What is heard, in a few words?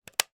timer plug switch